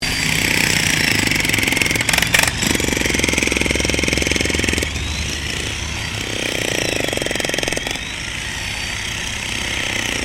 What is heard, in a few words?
JACKHAMMER BOSCH ELECTRIC DIGGING SOIL STEREO, Construction Bosch jackhammer